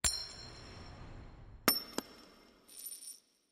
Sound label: coinflip sfx short